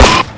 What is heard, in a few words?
Stinger Fire; Unreal final